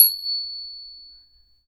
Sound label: CHING, CHINESE SET; Spectrasonics; Heart of Asia